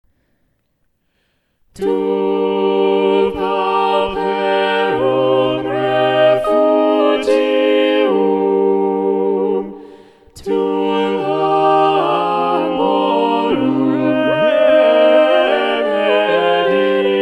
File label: SATB Balanced Voices; Tu pauperum refugium; Josquin des Prez